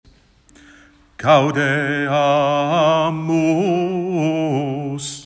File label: Alto Bass; Gaudeamus